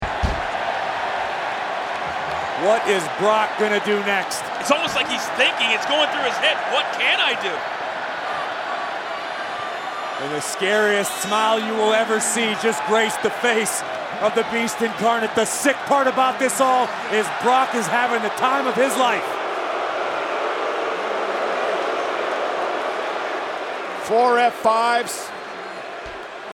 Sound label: random cheering and booing; The random boos? Those were for whenever a security confiscated one.; The random cheers? Those were for whenever a fan blew up another ball; roman reigns brock lesnar